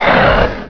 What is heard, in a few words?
idle1com, minotaur; quakemapping